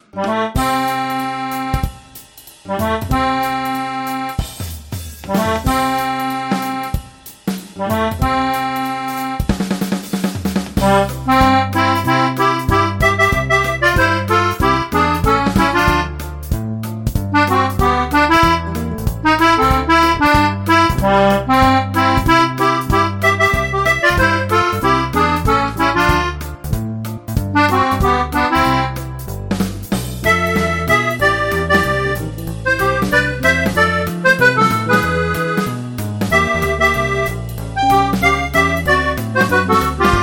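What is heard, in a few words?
Hohner Accordion, Mandolin; Akkordica; Banjodoline; Bassesland; Syntheway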